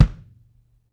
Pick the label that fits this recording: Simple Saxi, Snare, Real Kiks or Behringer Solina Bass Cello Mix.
Real Kiks